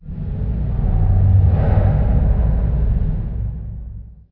hole hit, ambient atmosphere; Left 4 Dead; Source Engine; video game

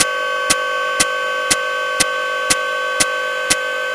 minifun empty; tf

